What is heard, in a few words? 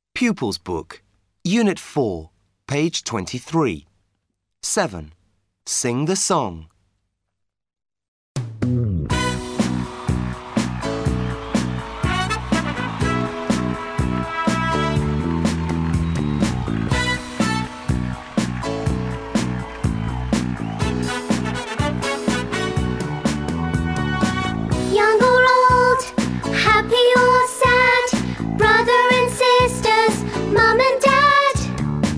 Song; Kids Box 1